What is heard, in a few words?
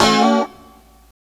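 G#3 B mono slide L, guitars; Spectrasonics; Hans Zimmer Guitars VOL-1; 90's Sample CDs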